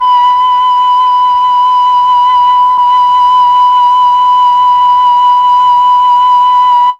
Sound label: flute; ILIO; Propeller Island Legendary M400